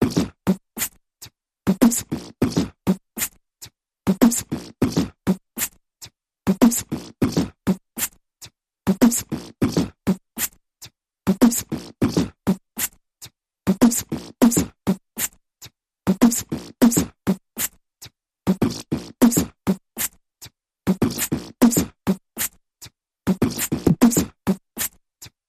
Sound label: Big Mouth Percussion Variation